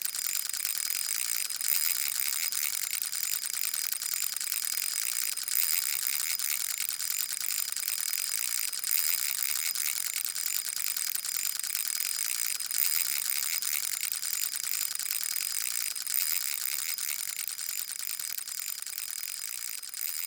Tether Reel Out; DriftMine

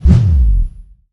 Paddling Big Jump

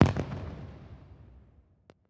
Celebration Fireworks

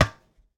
Tennis Racket Ball Hit Med

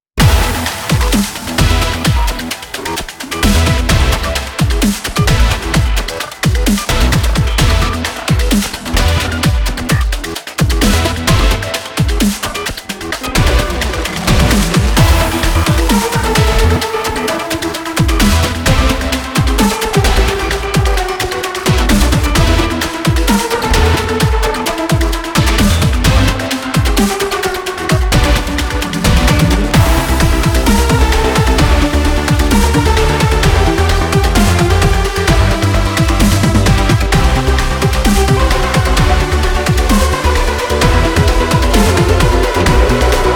Gameplay Loop; Scraprun

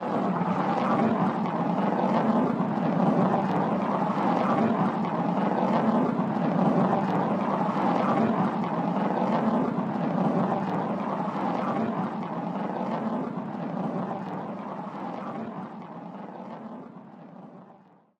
Player Hand Roll Very Fast

